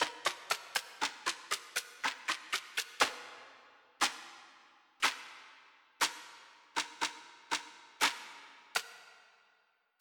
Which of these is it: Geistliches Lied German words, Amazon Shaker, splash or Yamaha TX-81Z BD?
Amazon Shaker